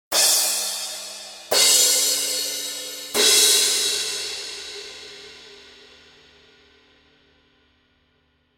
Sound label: Paiste Crashes